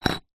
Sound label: rock or stone movement slight on concrete ground; foley